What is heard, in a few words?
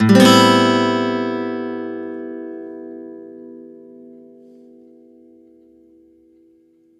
One Shot A min add9 downstroke, Acoustic Guitar; Sonic Foundry (Sony Creative Software); Songwriter Acoustic Guitar Companion